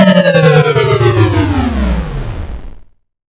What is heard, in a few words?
Retro Charge Off